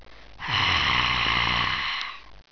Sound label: mummy alert